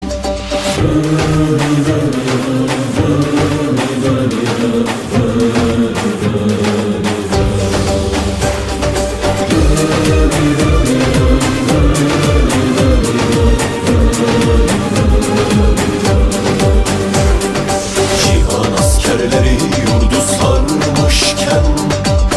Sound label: Dombra